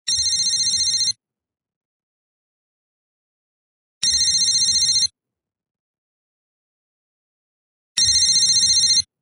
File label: Phone